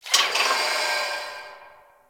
Venus Landscape Construction Site One Off, Ambience; Warframe; Venus Open World